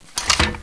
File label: rpg reload